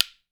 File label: WOOD SPOONS; Jew's Harp & Spoons